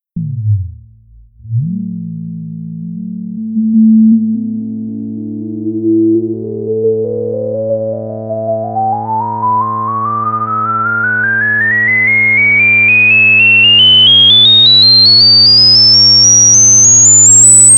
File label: iMini Jazz Bass Fiter Reso; iMini GTD